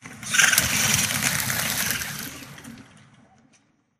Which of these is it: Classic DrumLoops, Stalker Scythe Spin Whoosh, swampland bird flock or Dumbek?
swampland bird flock